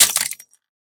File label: pistol drop glass; STALKER; IWP